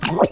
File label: bloody murderer damage